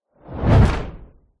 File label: sound design shockwave whoosh into metal slam